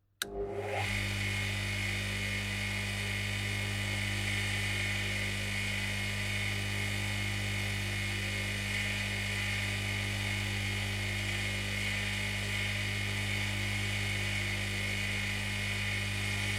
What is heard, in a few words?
Industrial Bench Metal Grinder On Run Off Slow Wind Down